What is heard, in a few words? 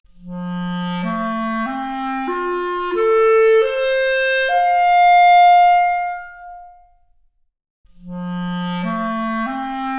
Clarinet arp, Stereo output; 3bit